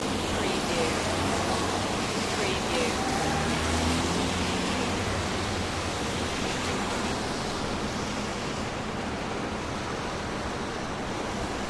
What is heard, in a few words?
City Traffic Wet Streets